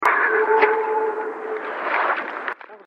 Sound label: howl